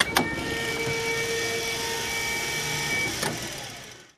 Electric Wheelchair Start, Run and Stop, With Switches